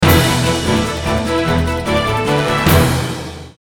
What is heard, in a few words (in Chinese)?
电视包装音乐